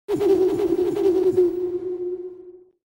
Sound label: cuica stinger; Bourne